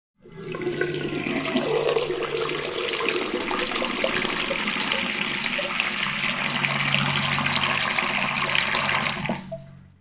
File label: Bathroom Sink